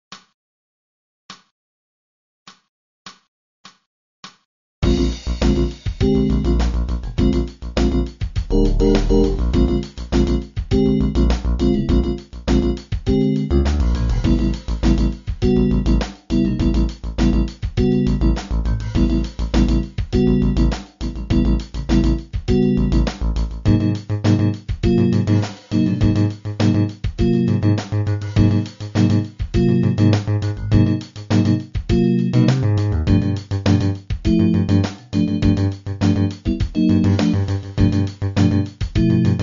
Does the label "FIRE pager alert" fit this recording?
No